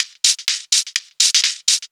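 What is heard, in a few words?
hihat loop 125 bpm, Percussion Loops, Hihat & Filter; Houseworx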